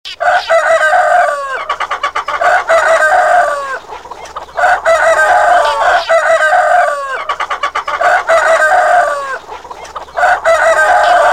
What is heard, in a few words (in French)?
Poulets Gloussant